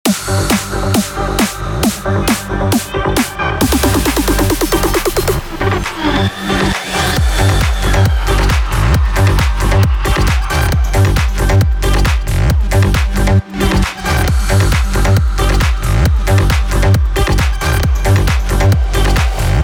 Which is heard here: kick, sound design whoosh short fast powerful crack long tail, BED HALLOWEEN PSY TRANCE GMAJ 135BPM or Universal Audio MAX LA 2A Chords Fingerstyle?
BED HALLOWEEN PSY TRANCE GMAJ 135BPM